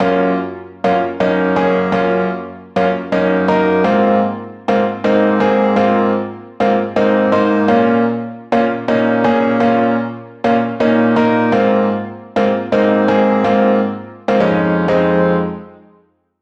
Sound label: Descent Bbm 125bpm